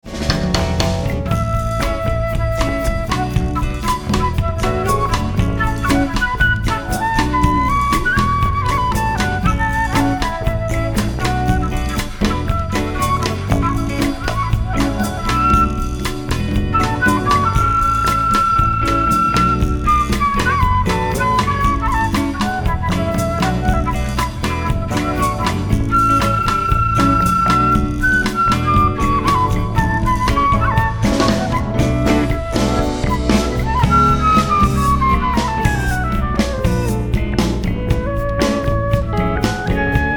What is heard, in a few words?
Bossa Bass